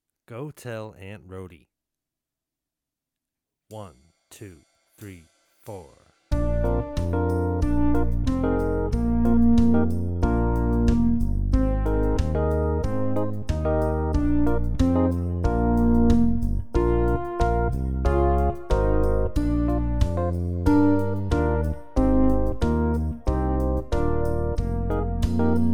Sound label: Play Alongs Rhodie